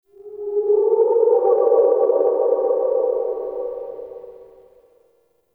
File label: Exterior Eerie, Ambience; Warframe; Duviri